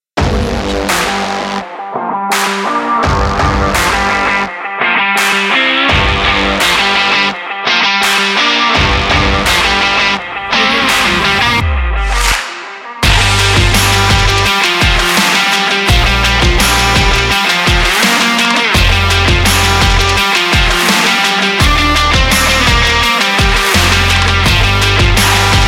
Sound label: action stylish rock